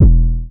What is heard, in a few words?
kick; osu